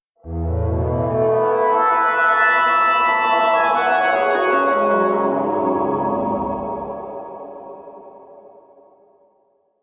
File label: Scifi Audio Logo Ident, Synthetic, Swell, Lingering; Scifi Audio Logo Ident 27,Synthetic,Swell,Lingering.